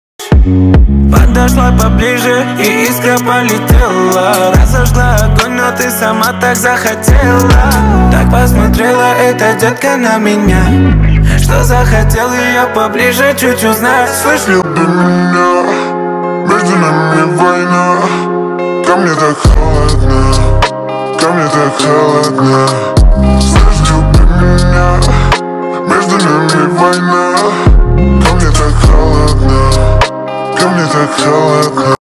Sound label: slowed